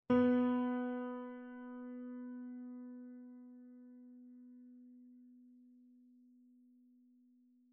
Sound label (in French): Do3 Pianoteq, piano